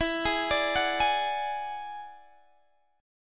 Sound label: E 9th E down Guitar Open D